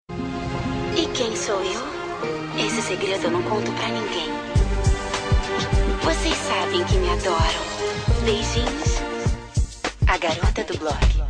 vinheta de abertura; gossip girl a garota do blog

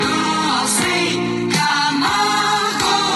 vinheta

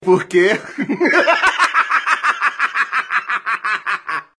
risada

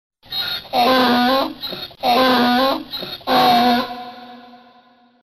som o berro do jumento

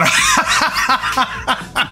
hahaha gargalhada

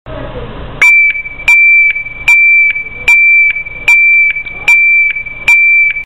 sirene de marcha re

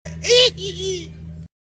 risadinha

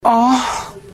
gemidao

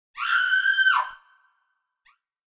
som de grito

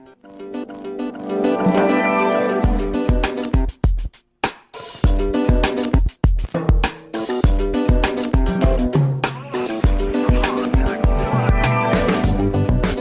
trilha de encerramento; fantastico

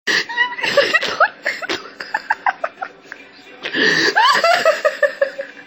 rindo

